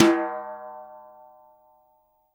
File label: Kit Brush, Drums Acoustic; USB Soundscan vol.10; AKAI; 90s sample CD; ALL BRUSH